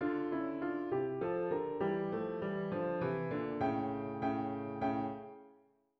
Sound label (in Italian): Appunto per pianoforte; armonia di Graziano Denini